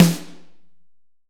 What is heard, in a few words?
HALL SN, HALL SNARE